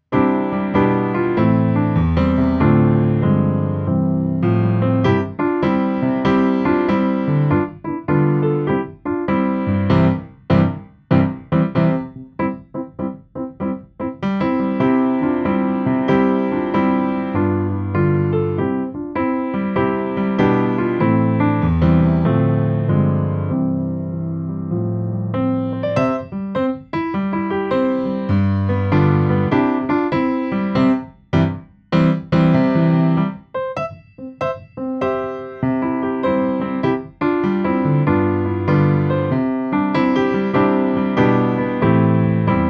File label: animals animals backing